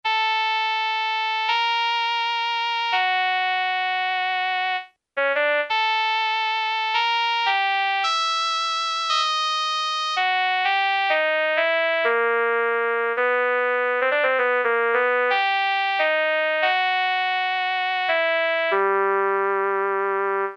OBOE, Yamaha SHS-10 factory voice

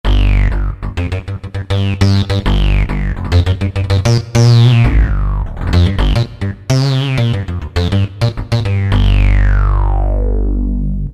Funky Reso-Bass, Roland PN-D50; Roland PN-D50-04

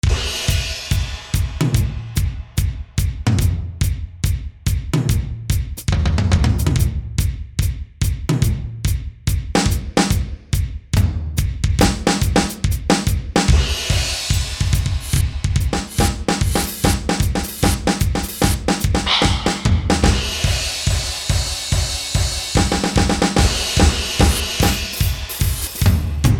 Huge Room, E-mu Pro-Cussion; Factory ROM kits

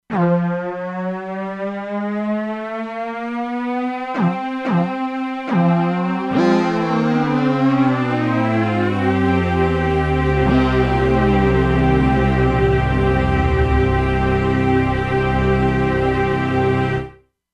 Portamento; Factory Presets; E-mu Proteus-2

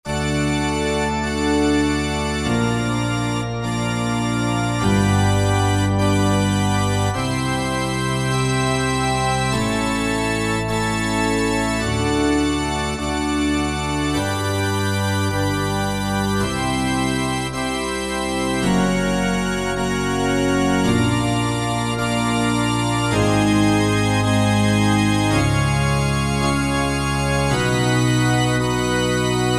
Pipe Tutti, Korg TR-Rack

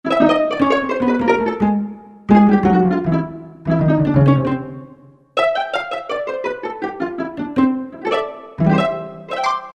Pizzicato, Korg T3

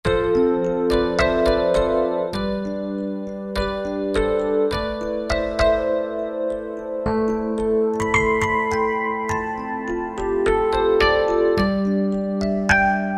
Dyno Rhodes; Piano; Roland SR-JV80-03 Piano

